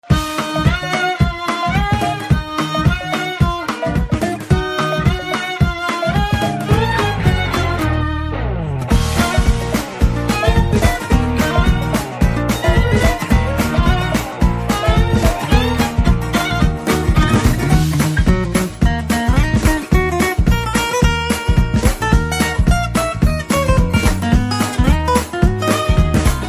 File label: Telugu tone ringtone